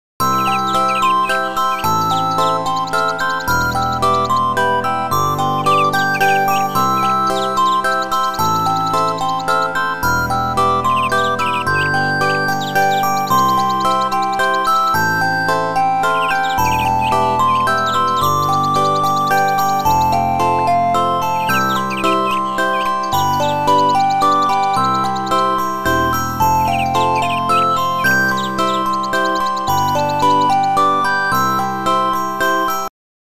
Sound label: Morning Bell; SKY IM-S500K